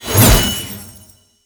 Boss Sword Attack Whoosh, Weapons Melee; Warframe; Orokin Weave Sword

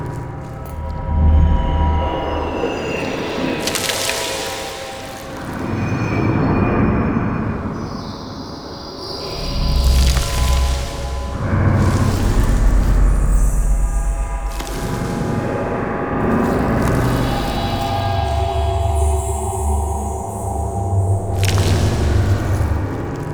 Infested Eris Interior Loop; Orokin

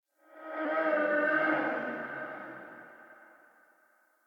Stag Vocal Pain Long Echo; Animals

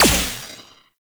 Laser Skin Printer Laser Start; Ambience; Props; Warframe; Entrati

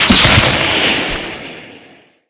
Jet Pack Dodge Burst; Grineer Jet Pack Marine

